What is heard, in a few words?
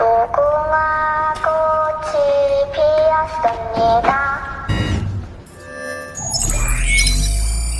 doll music; squid game